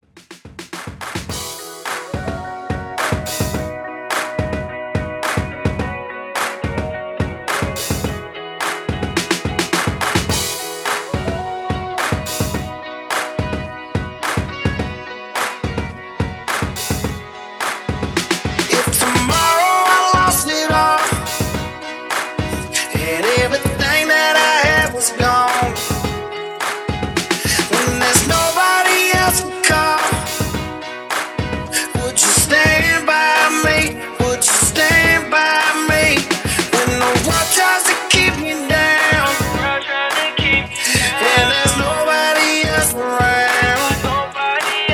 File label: Re Drum Country DownTempo 80 BPM